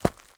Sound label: FOOTSTEP Trainers Gravel Compact Run mono; Phasmophobia